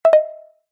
notification alert prompt bright ping pluck generic; zapsplat